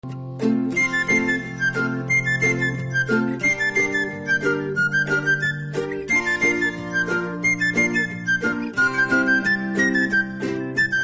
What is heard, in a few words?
Flute BGM Ringtone; from Karthi Sulthan Movie